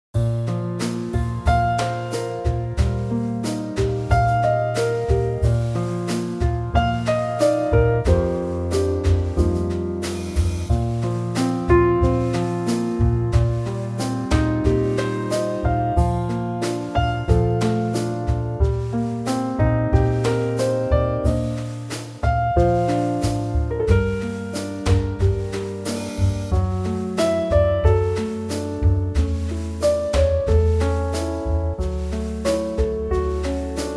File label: Bb Trio; key - Bb; Lovely Trio arrangement